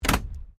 CAR HOLDEN HD PREMIER DOOR CLOSE GENTLE, Classic Australian cars; 1965; Vehicles